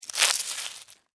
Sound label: Caterpillar, Bite, Chew, Munch, Leaf, Leaves, Insect, Bug